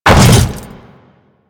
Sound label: Hammer Boss Land Default, Footsteps; Warframe; Grineer